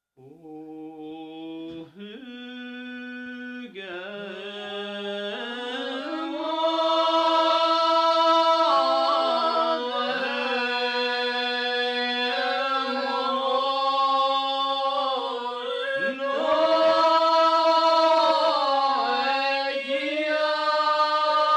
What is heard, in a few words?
gaelic psalm